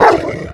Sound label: death gnoll, creep deaths; dota